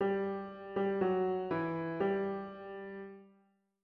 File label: melodic dictation